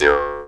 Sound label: D JAW, Primitive Instruments